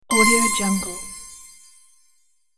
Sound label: chime achievement